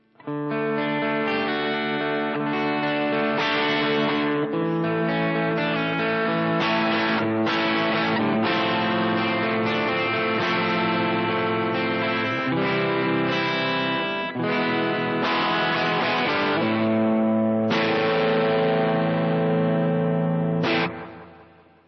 acboost

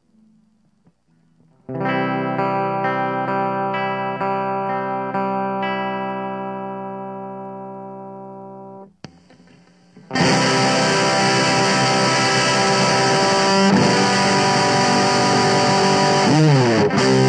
fuzz

